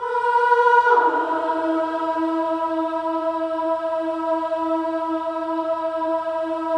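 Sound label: Voices, INTERVALS, 5TH DOWN; Spectrasonics; Symphony of Voices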